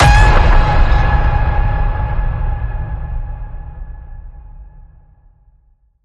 Ping It Loud, G sharp

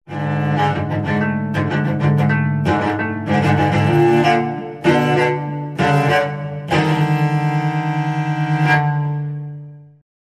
Cello Danger Eerie

